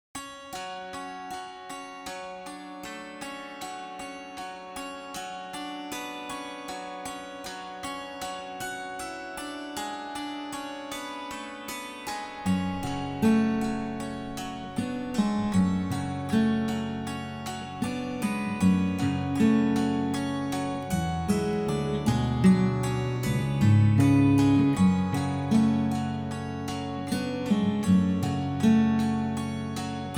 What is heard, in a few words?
Cinematic Folklore